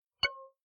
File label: Digital Click Neutral